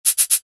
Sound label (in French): bip